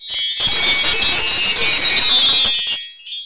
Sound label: Wind Bells